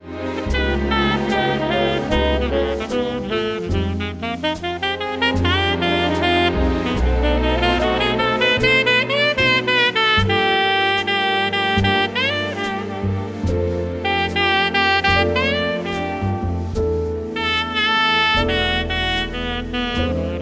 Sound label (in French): prelude en la mineur